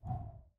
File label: wind; jocly; mana